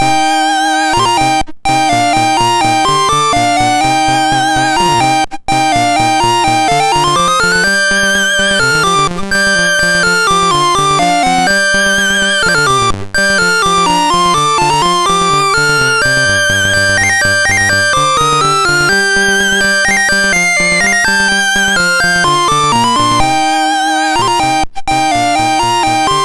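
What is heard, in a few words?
Operation Hormuz, MOS 6581 SID chip music, games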